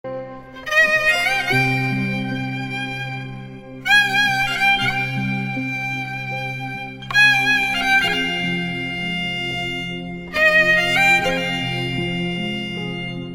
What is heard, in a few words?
Sad Music